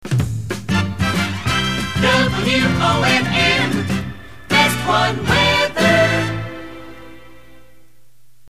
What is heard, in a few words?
jingle; WONN; wonn best one weather